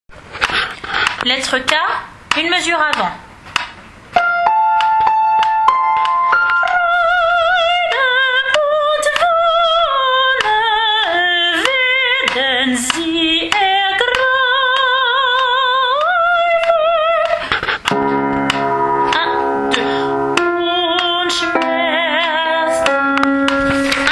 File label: Brahms requiem allemand soprano; lettre K